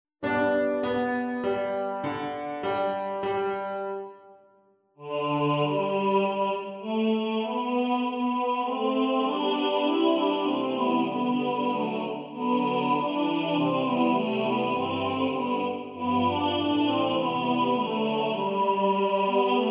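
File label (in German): Tenor1 im Vordergrund ohne Metronom, Männerchor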